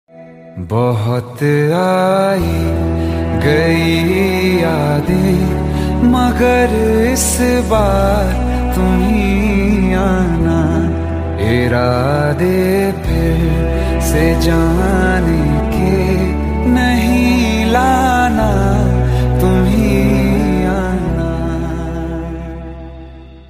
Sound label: Sad Ringtone